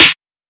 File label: snare; nolie; paryobeats